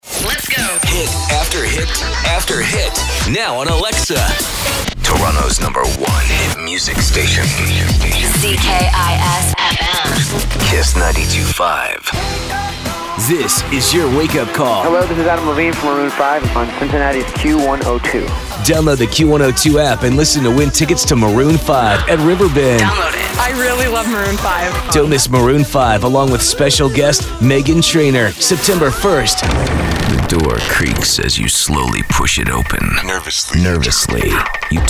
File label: Pop